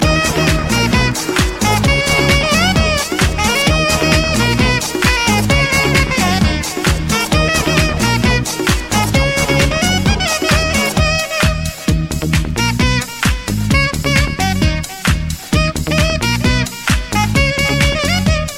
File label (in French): Trompette